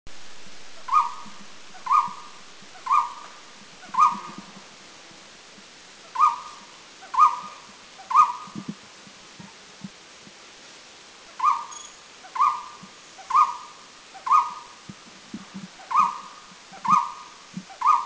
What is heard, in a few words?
Golden-throated Barbet Doi Lang, Thailand